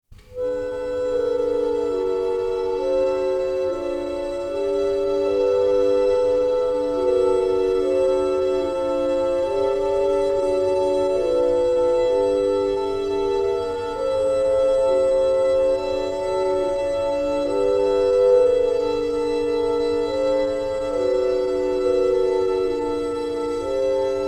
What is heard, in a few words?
suspense neutral